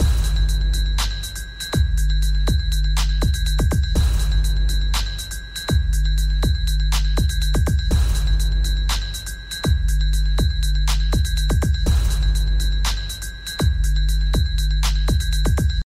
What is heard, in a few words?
beat ringtone; black panther